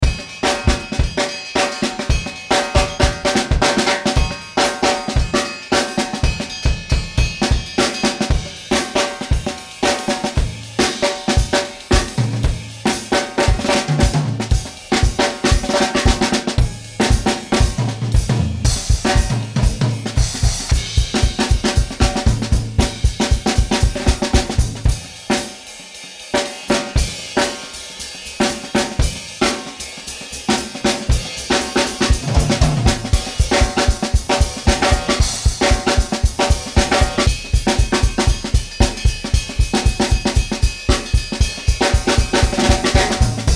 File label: yamaha 9000 drums